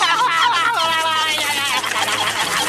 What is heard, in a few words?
doodlebob scribble